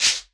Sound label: Elektron Monomachine, Drum Machines